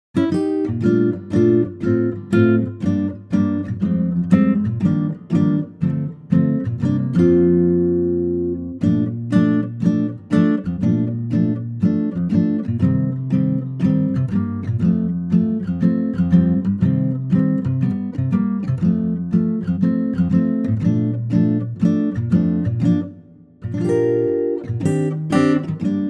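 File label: Bb to B acoustic guitar, male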